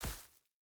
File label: footstep barefoot grass jump land; Rust